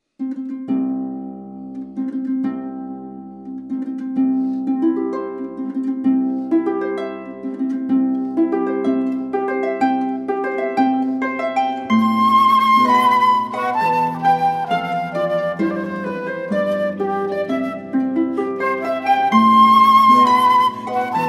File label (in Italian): arpa flauto; matrimoni